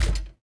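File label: droid bodyfall lg dirt; droids robots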